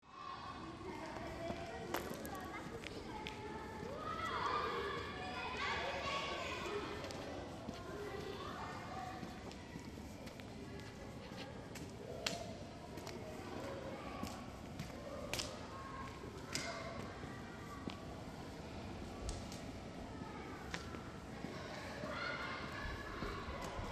la cour des petits

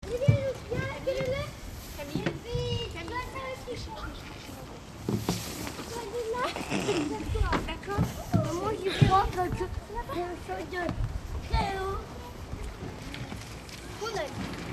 les enfants qui jouent

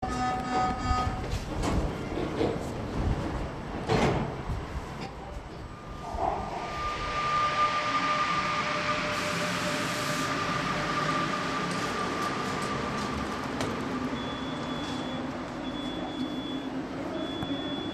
Depart du metro

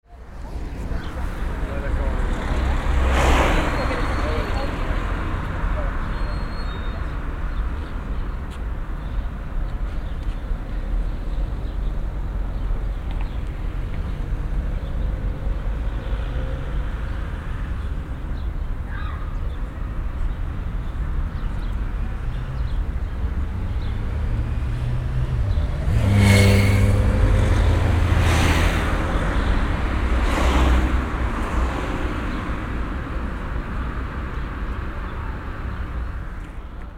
moto + vl

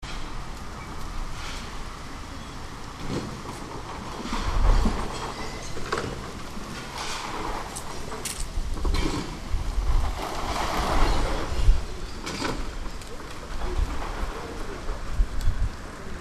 Travaux Chantier av Jean Mermoz

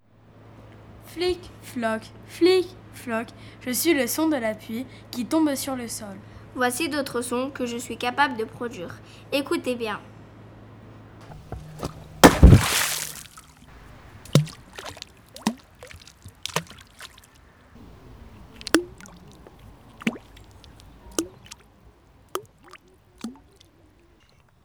eau mystère

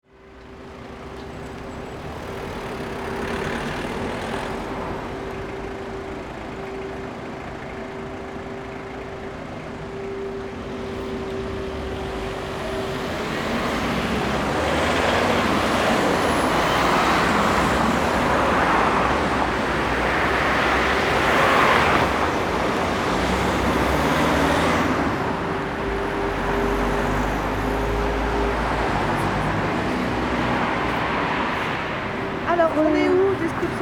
rue Branly

